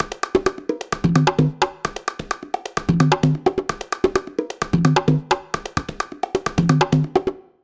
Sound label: 130 Percloop